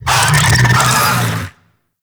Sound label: Infested Big Ashen Vocal Attack Death; Warframe